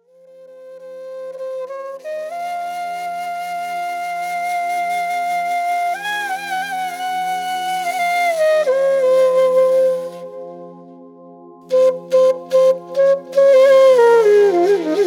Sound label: Eastern Flute